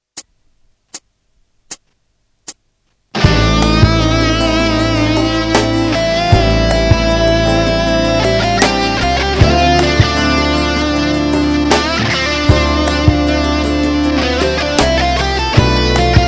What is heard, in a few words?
just a solo